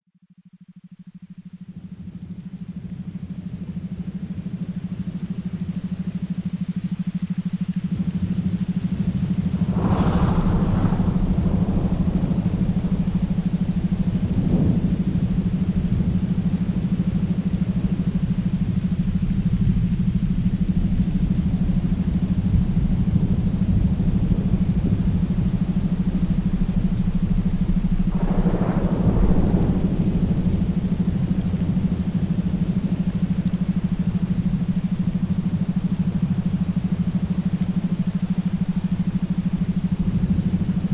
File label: iso learning thunderstorm